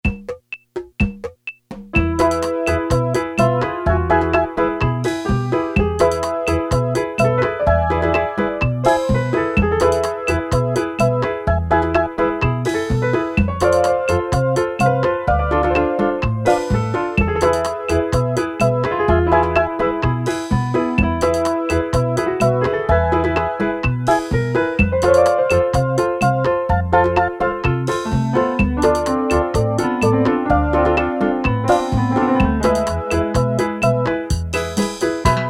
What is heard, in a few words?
Beguine, Casio CT-370, Rhythms